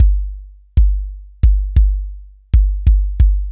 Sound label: Anabeat Kick; Sonic Foundry (Sony Creative Software); Prototechno